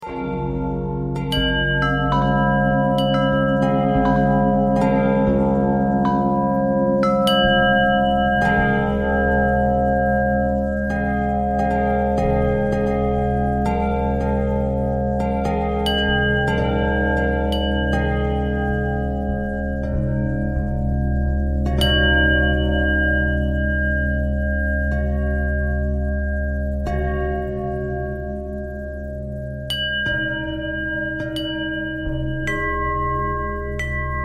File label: bell bell thread pentatonic, bells